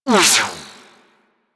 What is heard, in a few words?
laser whizzby; Enemies; Warframe; Vomvalyst; Teralyst; DE